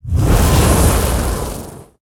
Fire Underlay Attack

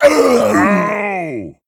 Male Ogre Death

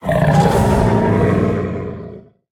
Trampling Rhino Death

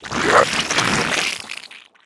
Slime Death

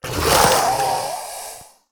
Skeletal Flayer Attack

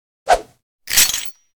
Attack; Claw N Cutlass

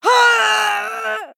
Female Night Elf Death

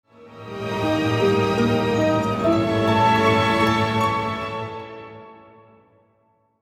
Play Stinger; Msshifn

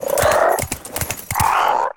Frog Mount Play Underlay

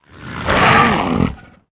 Death

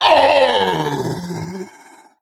Male Crypt Lord Death

